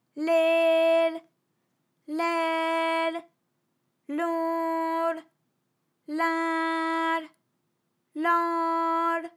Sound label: lehlailonlinlanl, vocal library